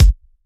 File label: Bassdrum; Vengeance Samples; VEE Bassdrums